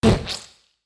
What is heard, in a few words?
Vocal Splat